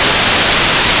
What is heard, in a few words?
white; vocoder